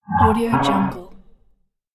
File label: creature voice